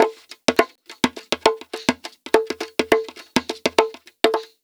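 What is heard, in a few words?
percussion, Roots Funk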